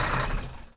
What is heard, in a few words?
Vehicle Jeep Stop; Playable Tutorial Level